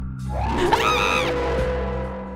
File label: jumpscare; IRyScream